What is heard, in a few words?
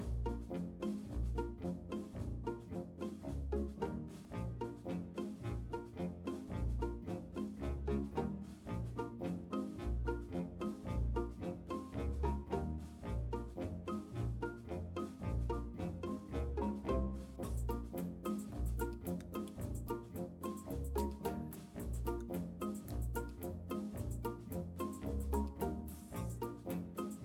music cheerful; bgm